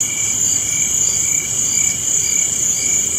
Crickets Loop; Wings; ambience ninjago

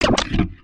Pet Beg Sit; creatures pets

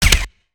Robot Move Arm Down; creatures mechanical; Lego

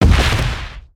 Footstep; Burno; aronwk collection; ndaudio; creatures organic

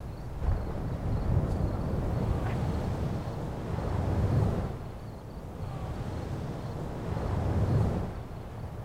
light ocean, ambience

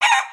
cluck; creatures organic

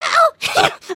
fear, emotes

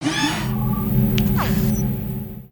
Clipperbot Idle, creatures mechanical

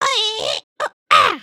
robot dance, emotes

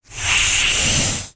Pets Tortoise Angry; creatures pets; Lego

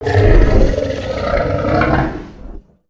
Dragon Idle; creatures organic

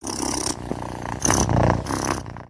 Stretch, creatures organic

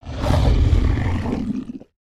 idle, creatures organic

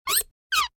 move; BroomBot; aronwk collection; ndaudio; creatures mechanical

